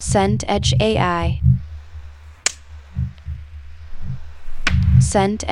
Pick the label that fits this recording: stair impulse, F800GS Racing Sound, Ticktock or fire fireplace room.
fire fireplace room